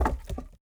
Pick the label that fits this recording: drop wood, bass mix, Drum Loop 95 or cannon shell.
drop wood